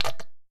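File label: Soap Squirt Out Bottle, splashes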